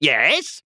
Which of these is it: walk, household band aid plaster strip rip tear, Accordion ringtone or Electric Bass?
walk